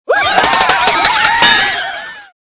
Win; DDPeng

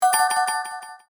Combo

